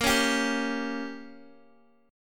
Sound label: Bb Minor 6th, Guitar, Open D